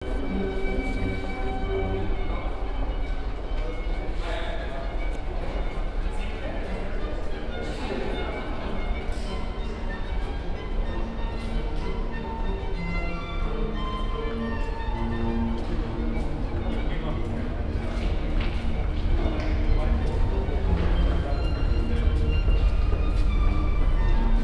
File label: U Bahn Subway String Quartet; Sound Scapes